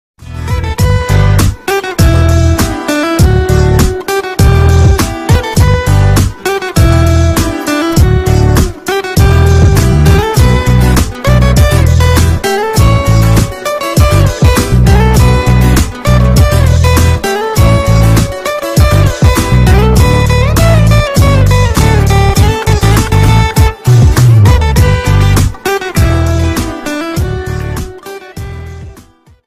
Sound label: love bgm; thegidi movie